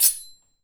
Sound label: General Button; netent